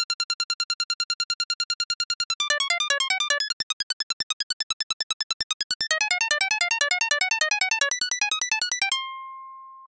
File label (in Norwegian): Komp3streng